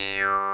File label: JEWSHARP; ETHNICSTUFF